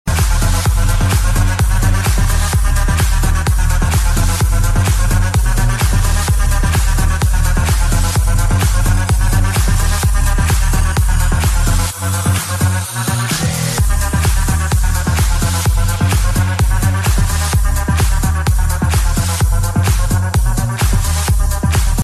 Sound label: techno hooter